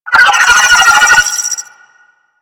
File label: Space Drone Attack G; Warframe; Corpus Space Drone